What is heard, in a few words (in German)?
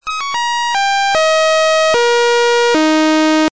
Naturtoene Es, Blech